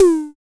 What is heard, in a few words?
DSM2 Tom; MPC DSM-2